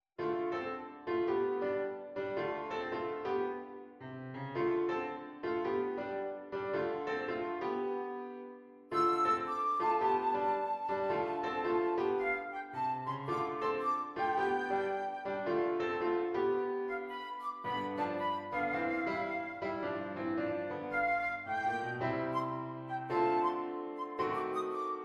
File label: Beautiful Wander flute, flute or oboe